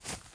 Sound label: footsteps grass